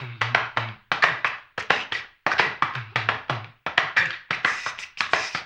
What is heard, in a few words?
HAMBONE, Vocal; Blues & Soul